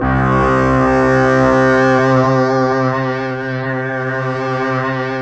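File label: SYN, Roland, synth digital; Sound & Vision - Gigapack I CD 2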